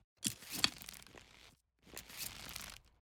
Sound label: pulling arrow